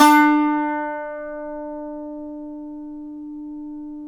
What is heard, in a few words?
SAZ; Guitars; Spectrasonics; Hans Zimmer Guitars VOL-2